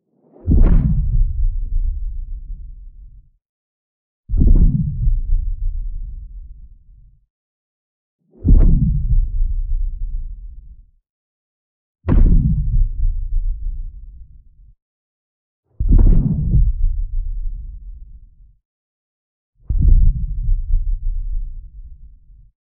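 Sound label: submarine launches strike cannon; Громкий гул, будто из глубины моря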